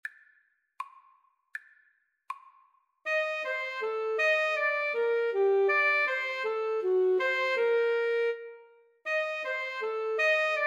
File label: Alto Tenor Sax Duet